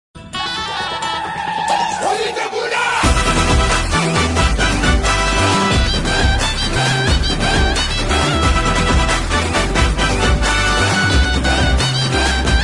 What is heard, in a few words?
Bgm Ringtone